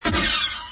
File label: blaster